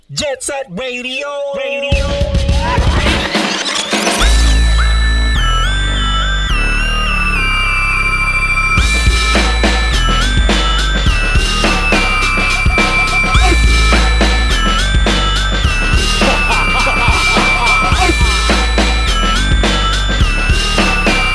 Funky Radio

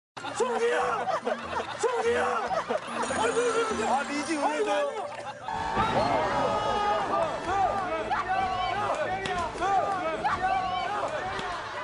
Tone

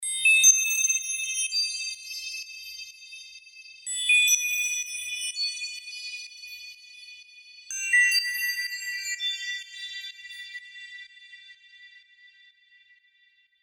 Sparkling

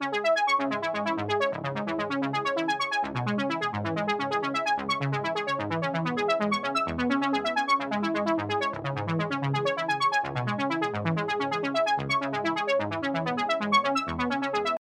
Futuristic

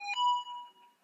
Original yahoo ding

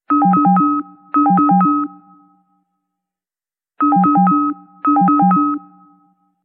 beep

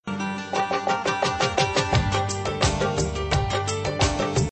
Old Tune

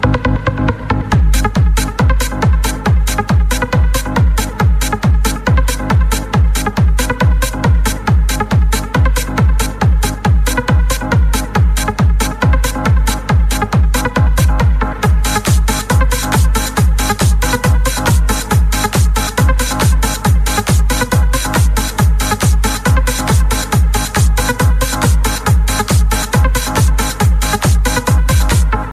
Techno Remix

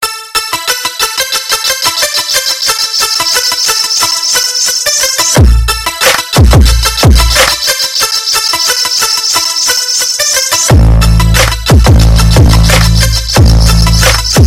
Basss

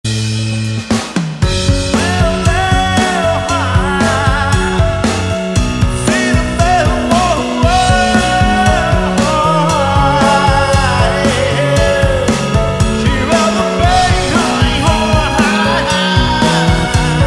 Music

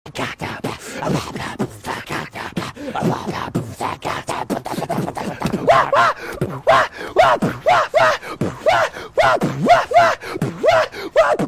beatbox; Teen Wolf

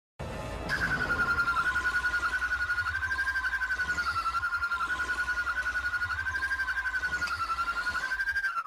Cry; Black Canary

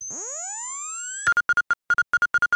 beep; Kotor

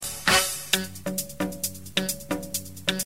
disco

